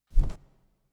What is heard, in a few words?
Bird flap